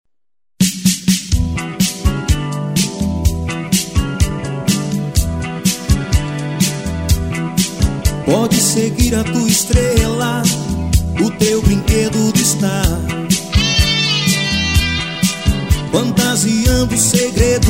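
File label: rock nacional medley, ao vivo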